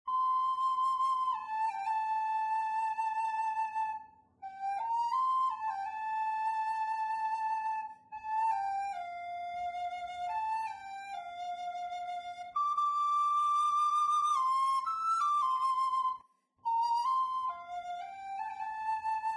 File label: Flute; Instruments; Zomboid; Lifestyle